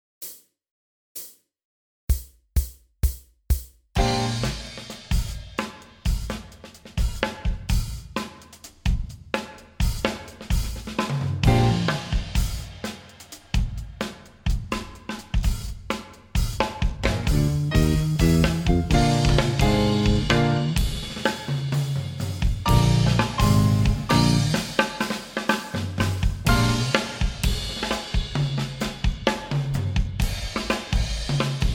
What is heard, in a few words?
sample solo rock drums